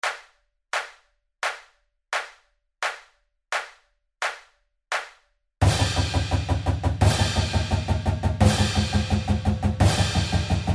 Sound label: Basic Strokes 92